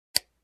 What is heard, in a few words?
Switch Click